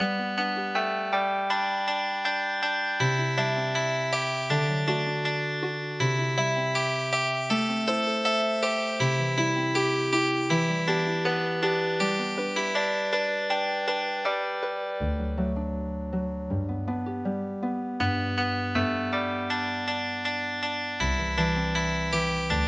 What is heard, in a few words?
Healing Harp